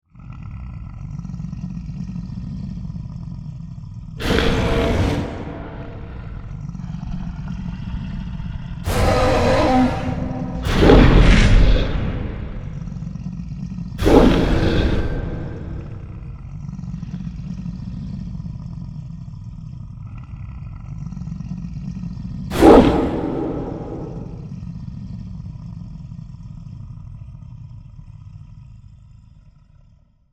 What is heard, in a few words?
Monster Attack